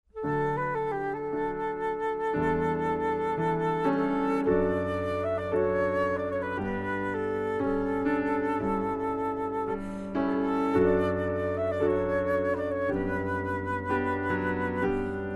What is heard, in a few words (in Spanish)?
El oboe; repertorio para bodas